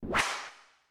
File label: Whiplash